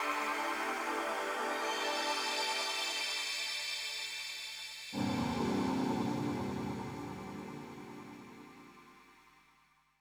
SPACE PAD 140 A; SpaceSounds

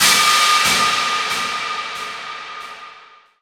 TECHNO FX 140

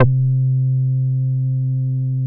hip bass C4; SE-1 BASSES

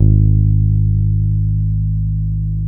BASS G#1 OLD, ELECTRIC BASS

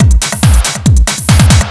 hard beats 140, hard trance beats

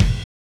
Hat; Touch Loops; ClassicBreakSessions

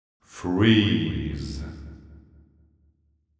deep house male, 125, A, vocals

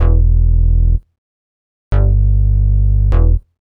DEEPHOUSE BASS 125 A

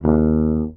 TUBA STRA D#1, Tuba Straight; Orchestral; DirectWave; Varazdin Orchestral

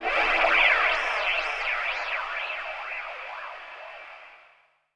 SPACE FX 140; SpaceSounds

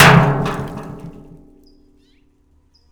hard hit, rust kit; dry; Nucleus Soundlab; Ambient Drums

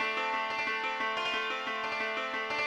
ETHNO 90 D

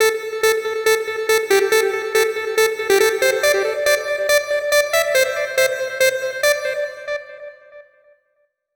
HARD SYNTH 140 A, Hard Trance, Keys

